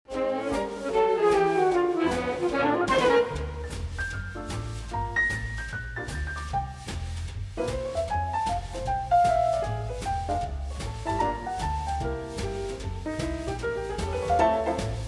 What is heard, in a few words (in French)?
orchestre